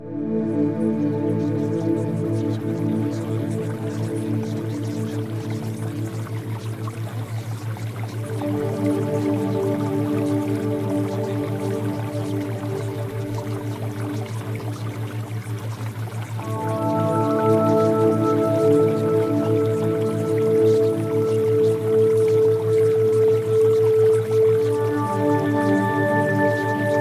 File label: Music Theta Waves; Premium Subliminals